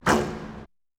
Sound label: bow resonnance